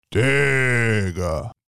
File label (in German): klingelton diiiiigggggerrrr